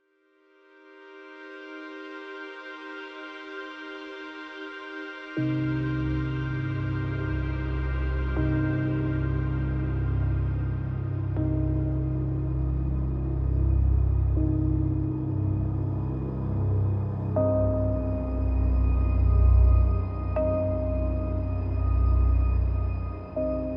Reaching The Peak Underscore, Epic Hybrid; Narrative Mind

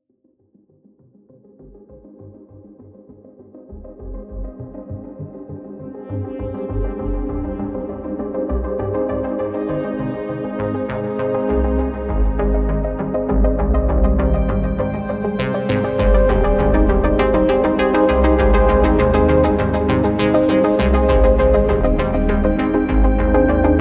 Pulsing Night Underscore; Narrative Mind

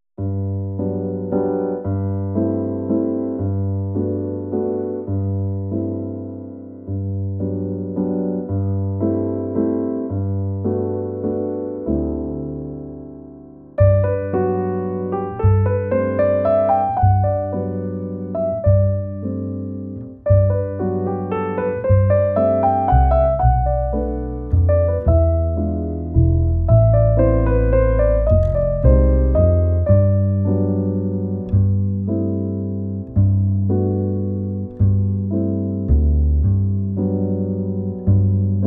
Soft Love Underscore, french lounge jazz; loving french lounge jazz; Narrative Mind

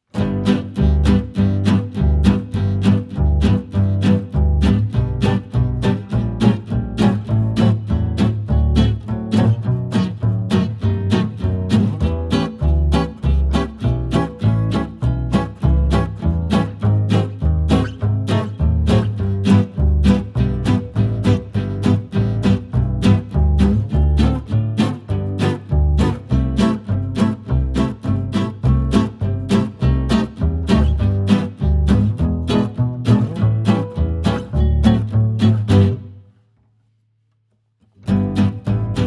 Swing Des Puces Underscore, Jazz Manouche

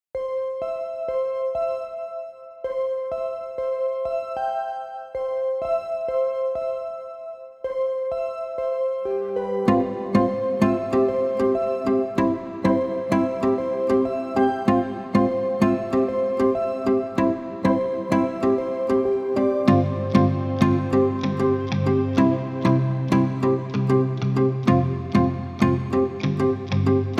Island Hopping Underscore, Summer Daze; mellow island beats; Narrative Mind

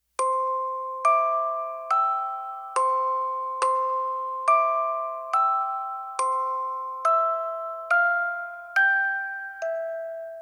music box version; Brother John; Tiny Tunes

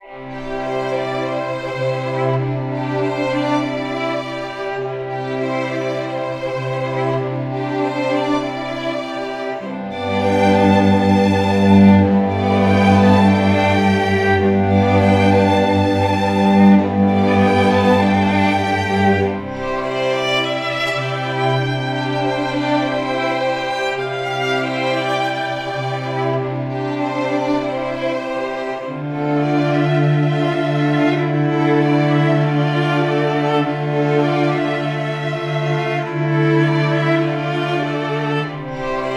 Downstream Underscore, Autumn Strings

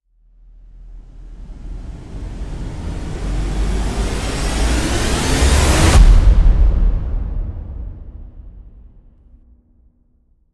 Impact, trailer tools, alarming; Narrative Mind

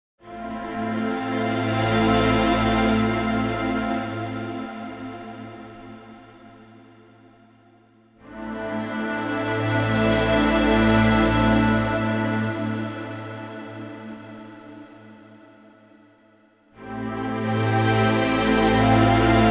Breaking Waves Underscore, Cinematic Textures

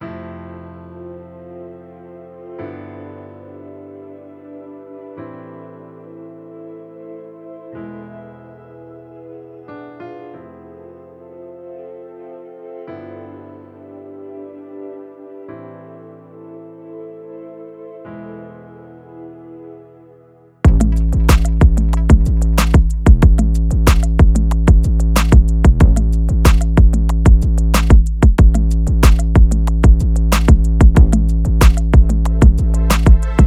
The Downside Of Vegas Instrumental, Epic Rap

